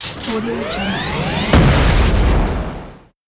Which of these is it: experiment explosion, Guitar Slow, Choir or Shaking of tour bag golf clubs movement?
experiment explosion